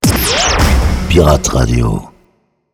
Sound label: sweeper intense